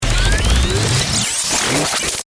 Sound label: Power Up Stinger, Radio, Stutter, Digital, Warble, Ascend; Production, Element, Imaging, Accent, Transition; Tech; BlastWave